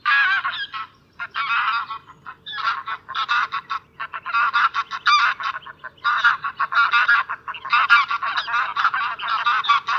Oca selvatica

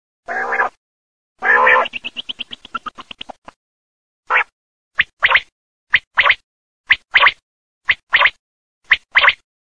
quaglia

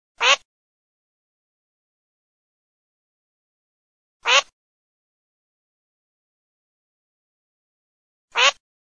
ALZAVOLA PIO

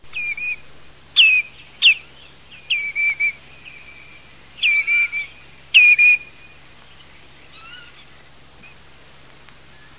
GAMBETTA

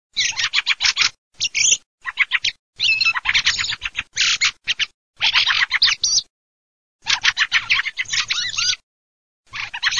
CESENA CESENA

